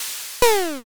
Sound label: sneeze